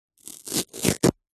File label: foley card toilet roll rip small section; zapsplat